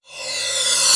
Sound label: Cymbals Reverse; Vengeance Samples